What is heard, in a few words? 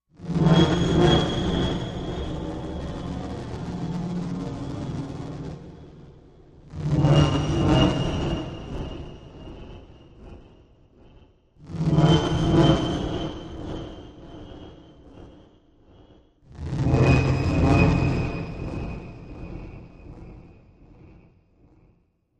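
Delayed Illusion Machine, electrical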